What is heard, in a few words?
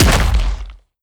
step; creatures; strider; seq passby